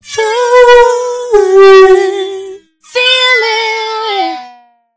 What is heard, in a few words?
Stereo Flanger Female Vocals Wet Galactic Asteroid Wobble; Sonicircuit; Syntheway